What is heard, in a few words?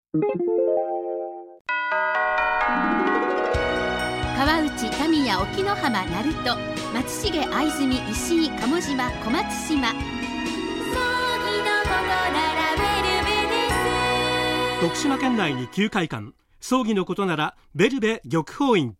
radio